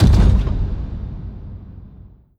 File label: distant step, strider steps; creatures; Half Life Model Pack